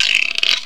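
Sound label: wood creak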